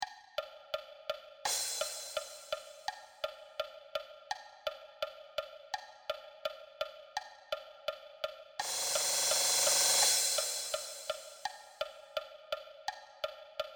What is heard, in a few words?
Percussion (Cymbal)